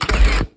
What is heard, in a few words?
Milwaukee Framing Nailer